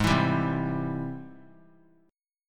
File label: G sharp Augmented Major 7th; strummed; 3C,3F,3A,3D; JGuitar